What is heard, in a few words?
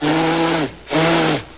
blowing nose